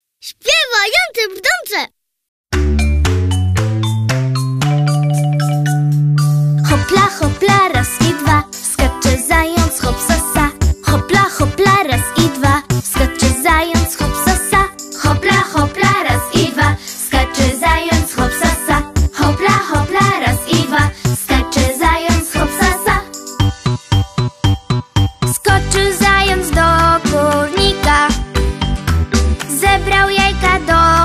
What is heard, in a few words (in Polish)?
Piosenka wielkanocna Hopla Hopla; Grupa Biedronki